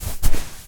footstep sand walk; ProSoundCollection v1 3 MONO Footsteps

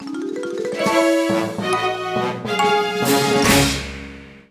super rare; Yokai Watch 1

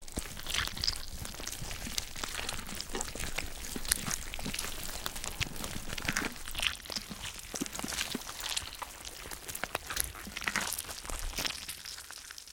Goo Tube; silent hill homecoming 2008 gamerip pc ps3 x360

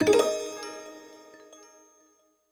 Jingle Harvest Complete; streetpass mii plaza; flower town

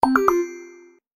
Positive Pop Reveal

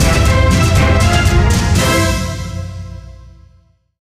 Wave Clear; Dillon's Dead-Heat Breakers; Nintendo 3DS

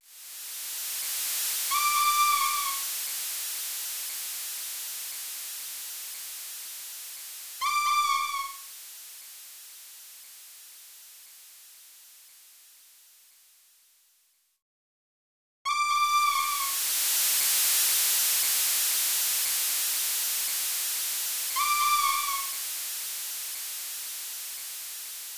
Beach Ambience; der langrisser

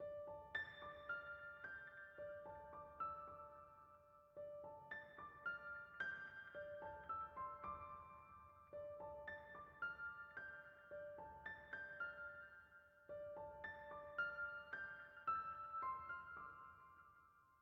Piano

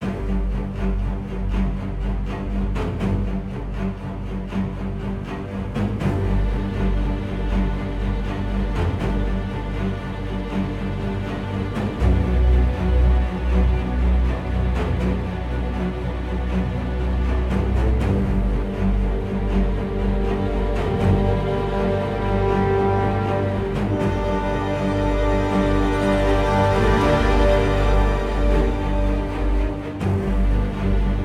Fight Theme Frantic Stem Orchestra; Oswald

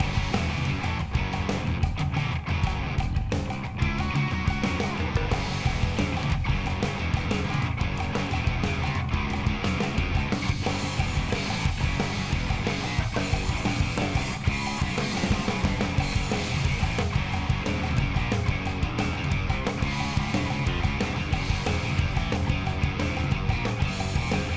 BGM Warming Up; Phase3

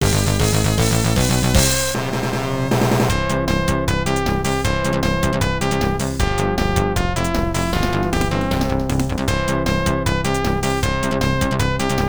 Battle Won; Brave Battle Saga; Genesis

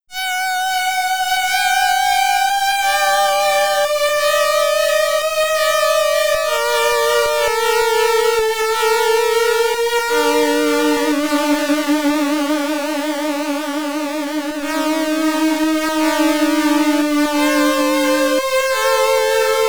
High Score SID Stereo, commodore 64; Warhawk

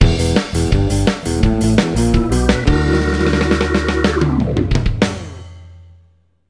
Jingle Game Over; Totally Spies Totally Party

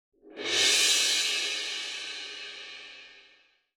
Rev Cymbal; Battle; Kingdom Hearts III Re Mind

